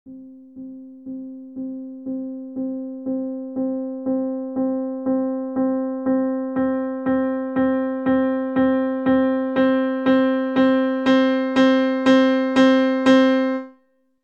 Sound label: piano velocity test